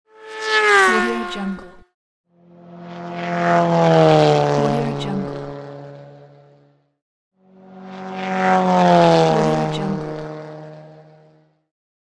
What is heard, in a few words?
racing car